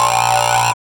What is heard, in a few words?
vox, electro vox